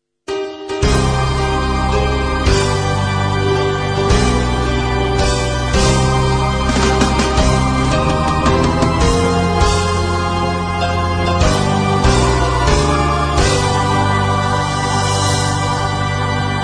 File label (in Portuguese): Parabens A Voce Rock; Aniversario